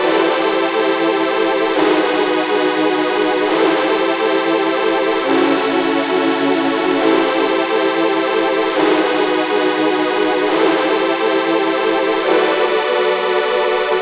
Pad 137 BPM A, Club Techno; Zero-G; Groove Loops

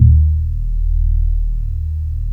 DEF D#1, BASSES

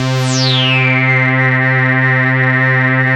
SYN ANA, Dance Synth; Sound & Vision - Gigapack I CD 2 (Roland)

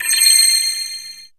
Ambient Atmos, Dance Synths

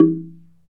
PRC SLIT DR, Orchestral Blocks; Roland LCDP03 Orchestral Perc; Orch Toys

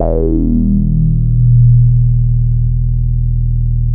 BASS D#1; CMP - Analogon

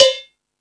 Percussion, Drum Machines

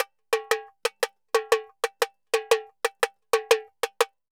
Tamborin 120; Best Service; Festa Latina VOL-1; Drums & Percussion

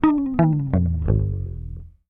HOF, Hofner bass; Sampleheads; Will Lee Bass Library; HOFNER SUS

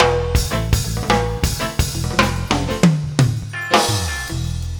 FILL, Ragga & Dub Reggae; FILLACOUS

